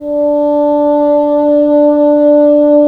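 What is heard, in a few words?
wind bassoon; LA Composer vol. 3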